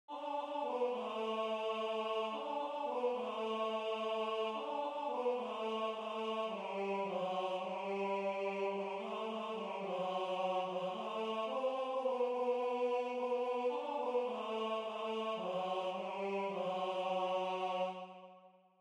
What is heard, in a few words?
Tenor